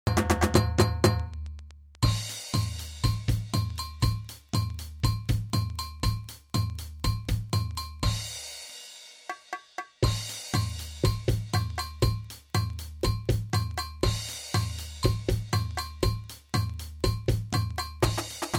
rock the drums

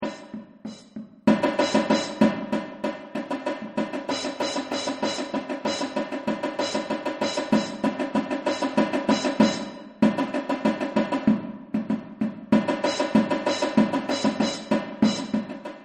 drums in session

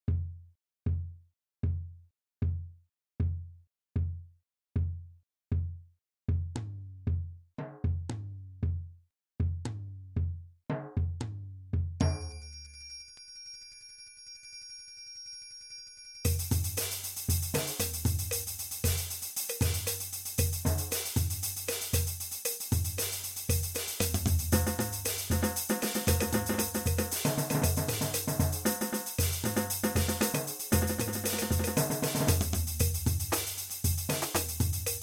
heart beats

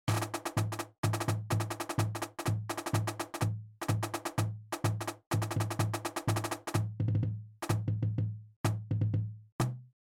first flam

